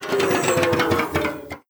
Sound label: manhack death warbling; npc; Half Life Alyx